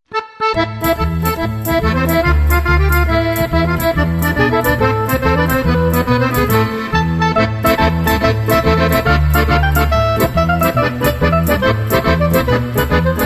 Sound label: tarantella